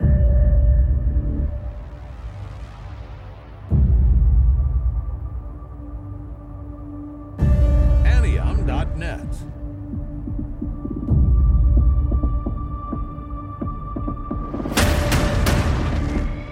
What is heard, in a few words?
modern horror trailer